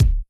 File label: Kick, Yamaha RY20